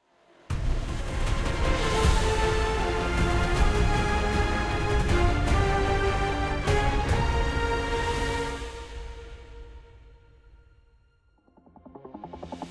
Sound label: mood intro victory triumphant